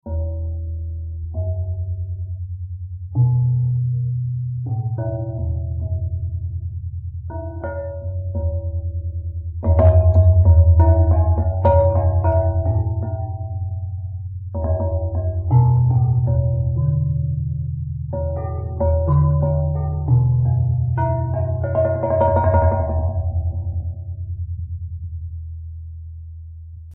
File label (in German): Basalt kontrabass steine